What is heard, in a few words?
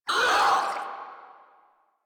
Infested Crawler Pain; Warframe